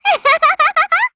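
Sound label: weapon get grenade; m4mod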